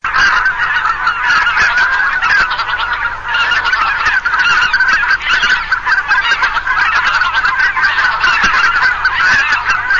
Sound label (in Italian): OCA lombardella